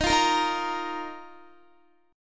D sharp Suspended 2nd, Guitar; D#sus2; strummed

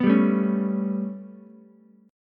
B 7th Suspended 2nd Sharp 5th down Ukulele, chord; B7sus2#5 strummed; JGuitar

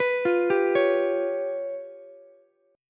Db 7th Flat 5th, Ukulele; Db7b5 arpeggiated; JGuitar

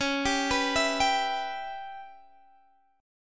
Db Minor 7th Flat 5th Db down Guitar DADGAD

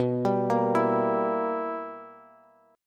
B Major 7th Suspended 4th Sharp 5th Bass, chord; BM7sus4#5; arpeggiated; JGuitar

